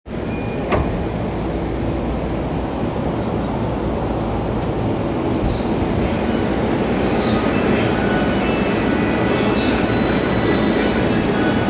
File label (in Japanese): 中央線快速武蔵小金井東, train sounds